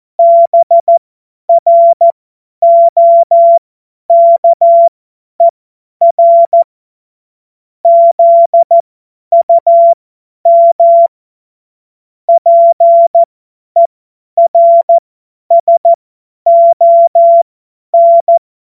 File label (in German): Witz, cw morsen; Morsekurs